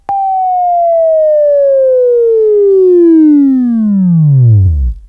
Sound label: Buzz; Labview-Program; Sweeping Training program